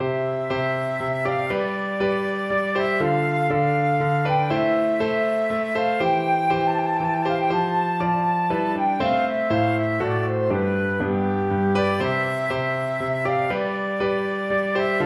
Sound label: chaconne, flute